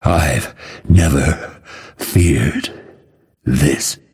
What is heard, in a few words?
death; dota; phantom assassin